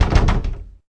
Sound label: Mimic Run; Seer; GamigoNA